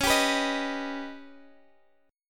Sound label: Eb Augmented 7th